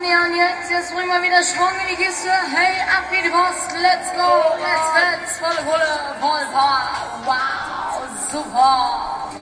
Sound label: foreign concert intro; Sound FX Real Life